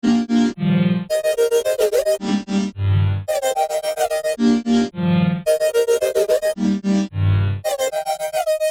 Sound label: Synth Loop BPM 110 D Maj; Gully Loops; Queen; UDD Gaye Sample Pack